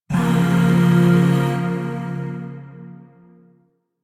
Shawzin Hirajoshi Chord E; Chords; Warframe; Zariman; Void

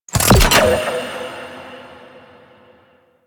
Salvage Pickup; Warframe; Quests; Wraith Quest

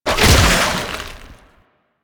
Golem Gun Spit Attack Fire; Warframe; GolemRemaster; Plains; Attacks

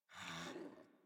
Puppy Sleep Idle Snore; Enemies; Kubrow; Warframe

